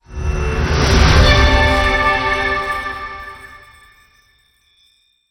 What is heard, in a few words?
SYSTEM SE PERFECT CLEAR JINGLE; Tales of the Rays